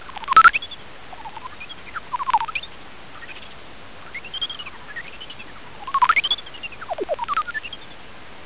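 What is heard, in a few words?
horseshoe, bat sounds; hshoe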